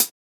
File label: Closed Hat; Ghosthack Advent Calendar 2017 Day 5